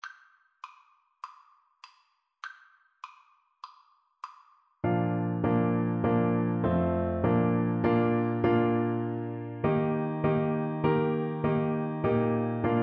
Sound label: Trombone